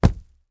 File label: Impact Object Dropped Ground Dull Thud